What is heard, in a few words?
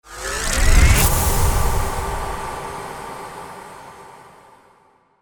RISING IMPACT